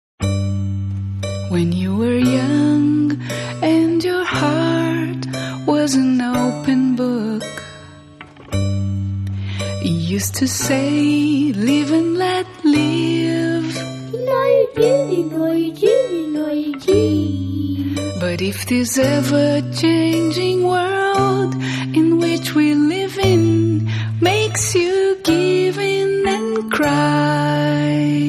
musica de brinquedo

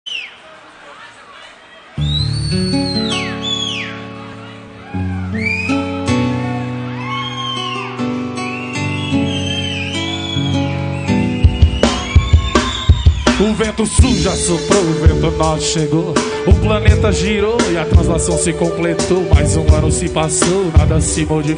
rap ao vivo; sampler rap

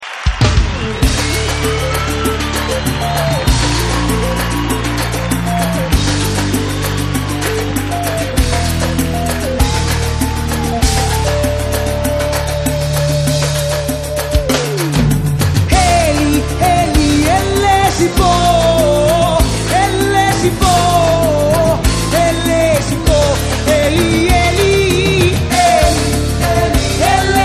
ao vivo em Salvador

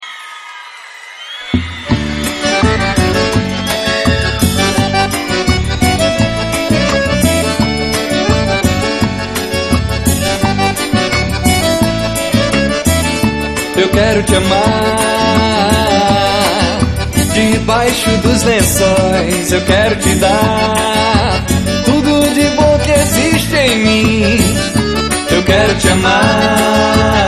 xote baião ao vivo

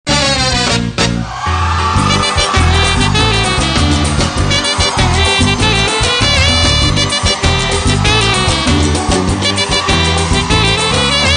samba swing de rua

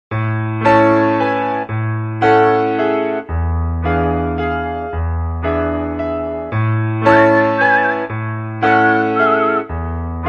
cantigas de roda; canc folclor brasil

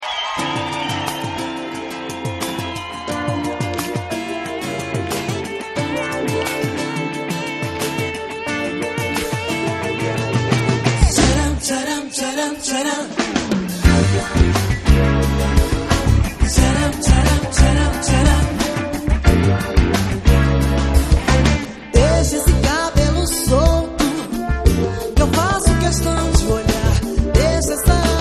samba

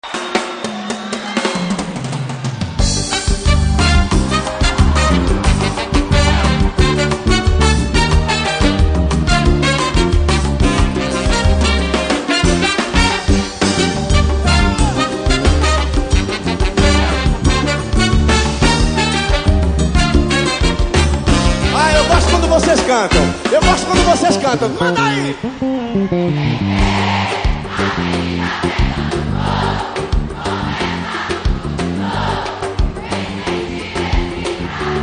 samba ao vivo em Salvador

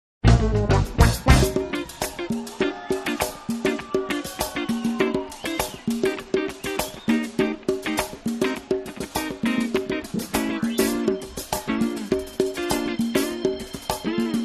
samba

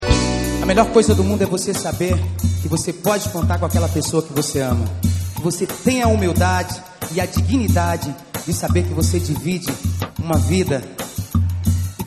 forro

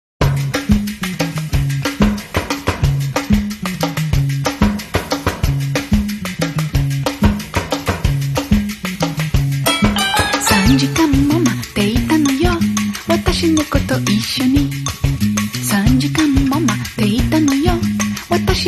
musica de brinquedo

